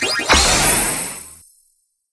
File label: win Animation; koi princess